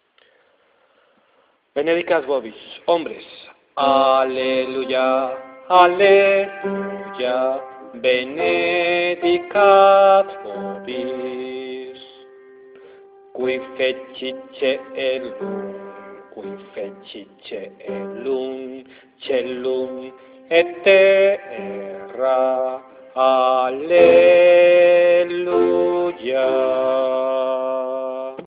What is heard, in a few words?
bajos; benedicat